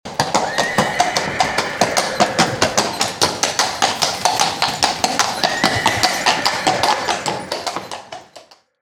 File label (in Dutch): Tractor Paard